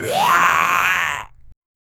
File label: 8-bit die a zombie sound